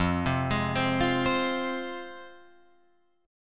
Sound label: F Minor F down Guitar Open F; arpeggiated; JGuitar